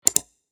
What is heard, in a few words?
mouse click